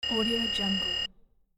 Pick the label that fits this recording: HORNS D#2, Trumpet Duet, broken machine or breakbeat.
broken machine